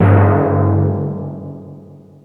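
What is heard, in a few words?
Timpani, Yamaha SY22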